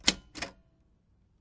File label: keypress, Typewriter; UNUSUAL KITS and FX; Bauhinian Vibes Typewriter Kit